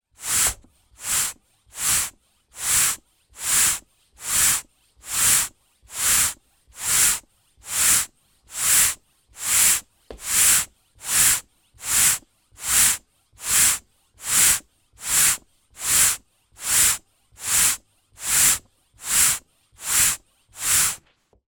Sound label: household hand pump pumping by valve; zapsplat